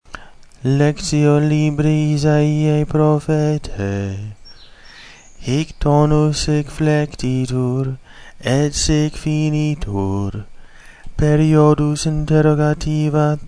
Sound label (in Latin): Lectio I Tonus simplex, chant